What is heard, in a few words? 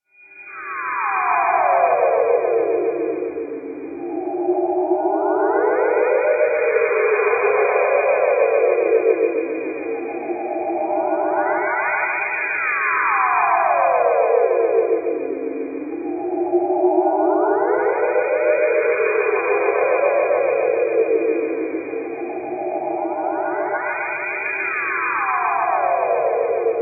lab special; background; TOS